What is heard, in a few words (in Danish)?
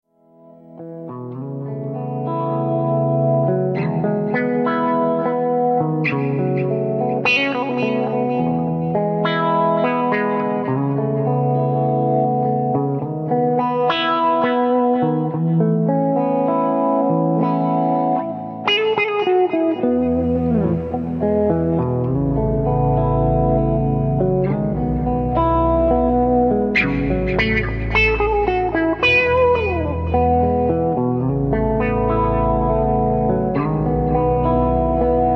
LP 1500HZ stryger; Hjemmelavet Fra Gamle Kasettebaand